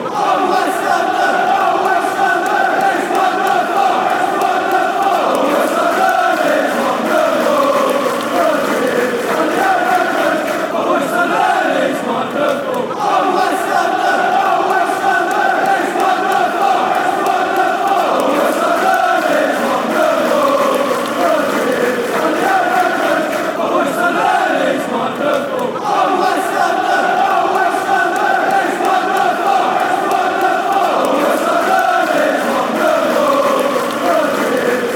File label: oh west london oh west london, fan chants